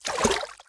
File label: Swimming Splash; MiniGames